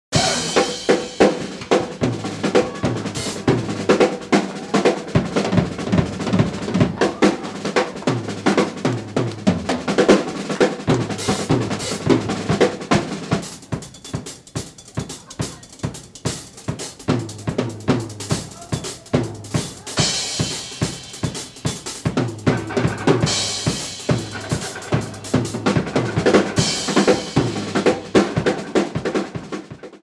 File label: solo